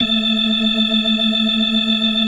FAST VIB, TREMELO+VIB, Synth and Organ; InVision Interactive; Keith Emerson

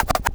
SCRATCHES; Scratch 1

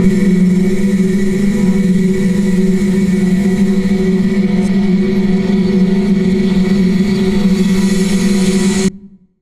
Distorted Atmosphere; Zero-G Sound Sense Grime Scene; 90 s Sample CDs

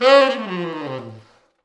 T Sax falls, Horns Sax

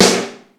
HADES SNARE, 12-Bit Drums; E-MU Formula 4000 Series Vol. 1; Hip Hop Nation

